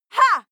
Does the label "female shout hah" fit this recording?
Yes